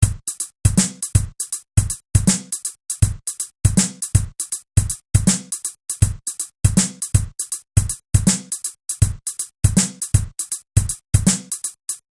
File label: perc